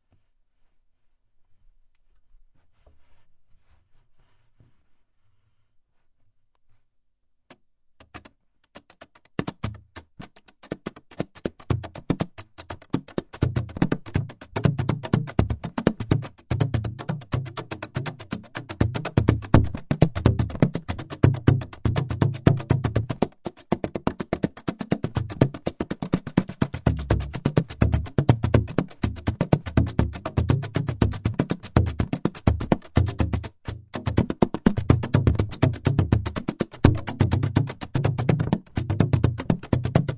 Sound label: Bodhran Improv